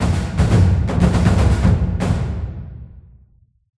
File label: Jingle Win